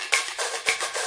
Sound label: bright samba, 110 bpm, drum loop; 101 to 110 bpm